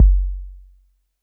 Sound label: 808 K, Roland MV-8008; Roland MV8800